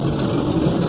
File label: box push grind; star-wars-rebels-team-tactis